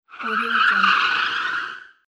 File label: tire skid